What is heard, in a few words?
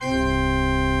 Church Organ C 1; Best Service; Advanced Media Trax Modern Composer